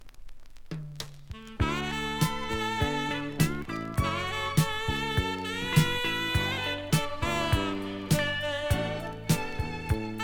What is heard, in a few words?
Jamaica Modern Soul Crossover; UK Islands